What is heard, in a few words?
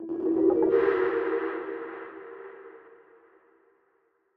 tutorial transition fx; Pitch Black - A Detective's Demise